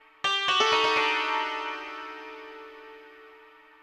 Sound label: ethno, 125, A